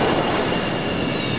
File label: decelerate